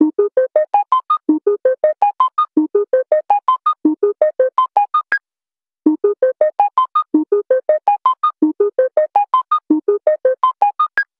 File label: ringtone manhattan; The Light Phone II